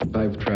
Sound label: techno intro